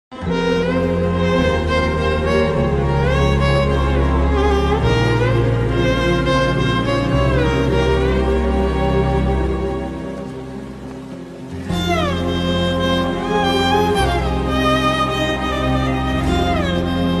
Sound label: sad bgm movie sad ringtone bgm; baby movie